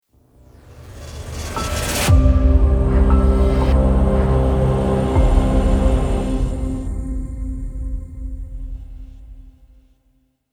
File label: Elegant Logo Ident